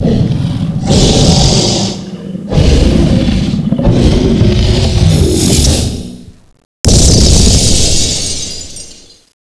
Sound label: skill broken ice draw; Frozen Terror